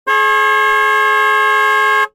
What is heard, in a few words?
transport car horn beep long blast